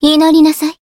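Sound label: attack, Japanese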